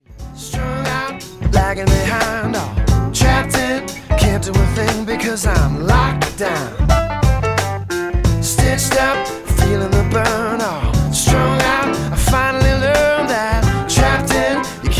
Jazz Bar

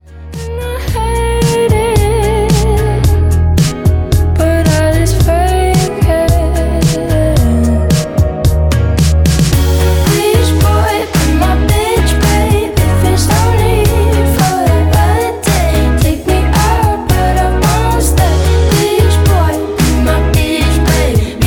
Indie Pop

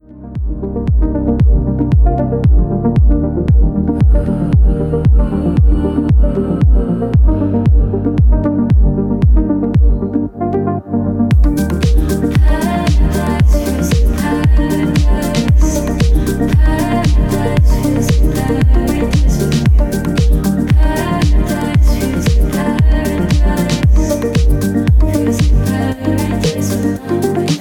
Chill House

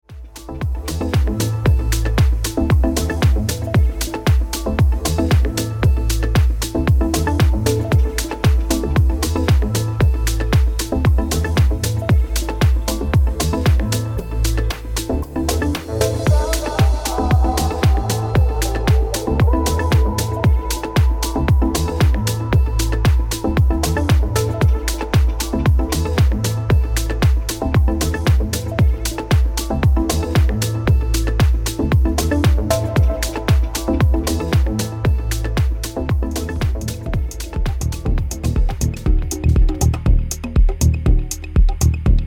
Elektro Lounge Night; Dayparting

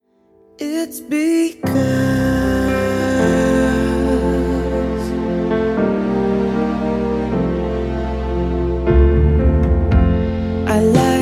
Pop Soft Pop

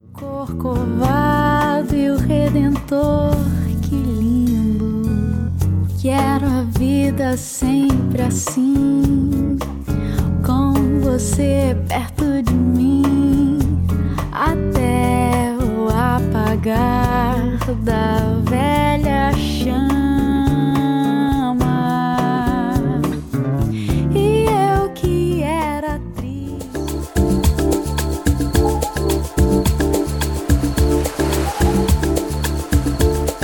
Latin Lounge

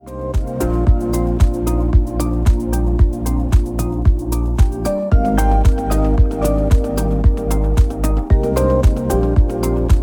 Ibiza Chill Lounge

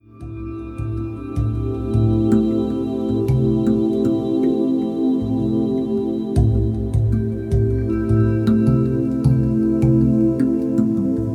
Chill Meditation

